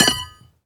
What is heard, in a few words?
HORSE SHOE, SPORTS; 1000fx